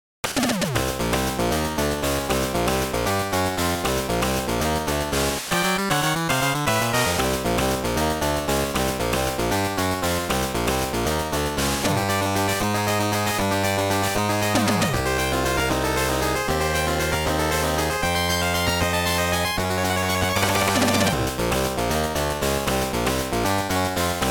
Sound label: Boss Fight; the cowabunga collection